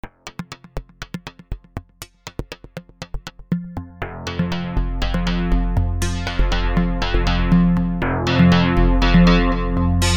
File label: arper